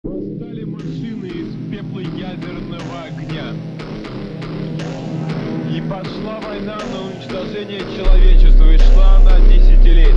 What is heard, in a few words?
music phonk aggressive